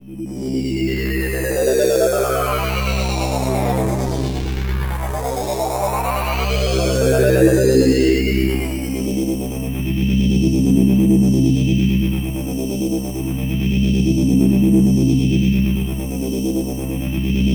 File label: Access Virus B; Synthworx